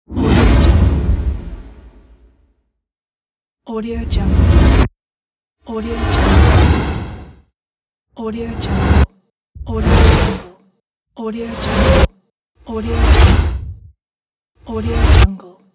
whoosh; rawaudiolab

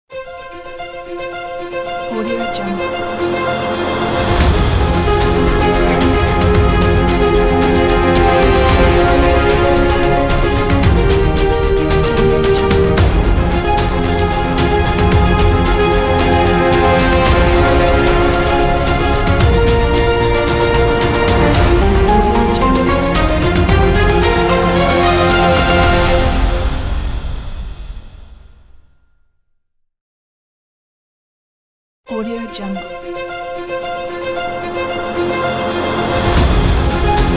epic trailer news ident

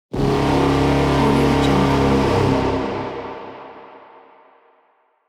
cinematic war horn

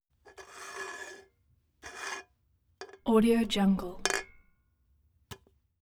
can empty slide on desk

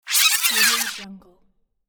speed up

